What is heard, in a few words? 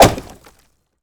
Bullet Impact Wood